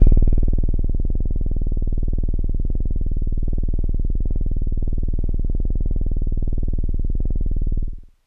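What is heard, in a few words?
Kawaii D#5; keys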